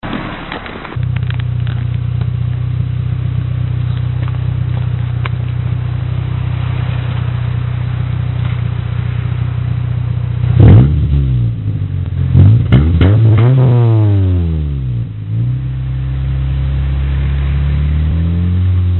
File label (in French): Silencieux tuning inox sur une 1.6 a l'aret; Silencieux tuning inox FXR-Corp sur une 1.6 a l'aret